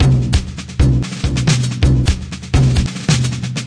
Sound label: 131 double percussion bigdrum switched, drum loops